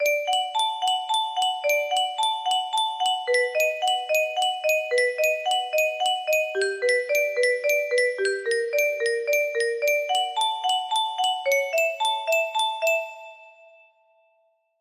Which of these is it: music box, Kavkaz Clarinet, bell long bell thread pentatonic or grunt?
music box